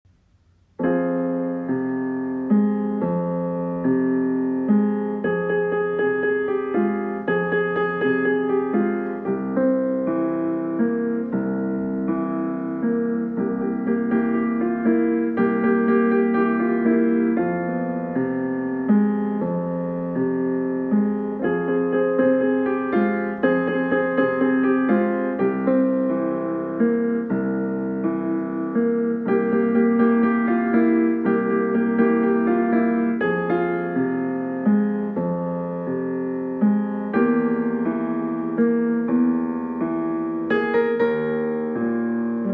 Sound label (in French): version piano accompagnement